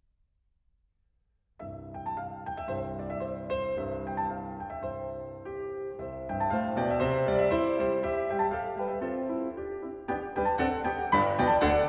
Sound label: Norwegian Folk Dances Halling